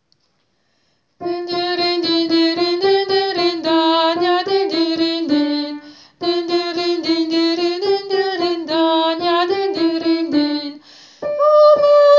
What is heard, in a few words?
dindiridin soprano